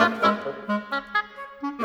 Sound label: Winds, Orchestral; Sonic Foundry (Sony Creative Software); Orchestral VOL-2; Modern 23